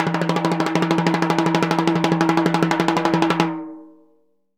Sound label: TIMBHI LON, Synclavier Percussion; ILIO; Synclavier Percussion 2; World & Orchestral